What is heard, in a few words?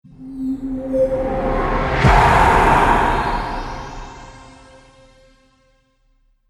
Trailer Hit Monster; Trailer Sound Effects; sound design